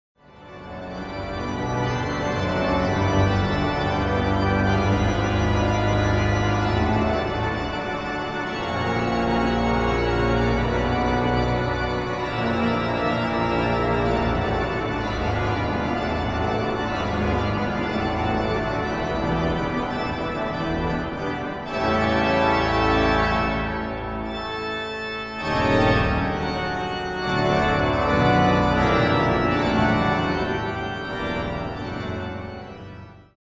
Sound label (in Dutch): Improvisatie Psalm